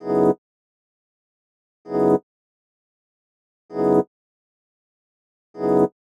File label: Epian Loop 130; Equipped Music; Loungin House